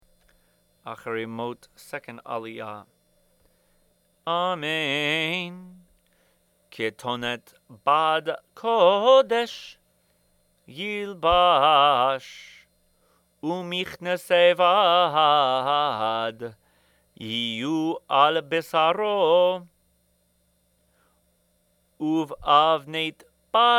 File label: Torah Chanted; Akharei Mot